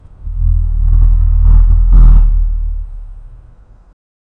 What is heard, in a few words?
a sound of rumbling roots